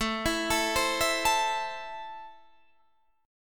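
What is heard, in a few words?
A Minor, Guitar Half Step Down, chord strum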